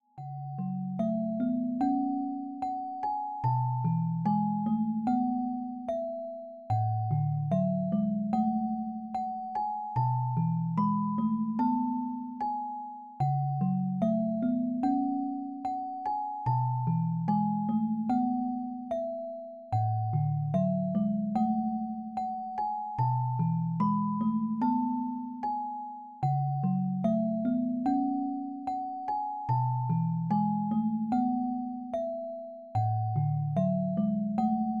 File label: bgm orgel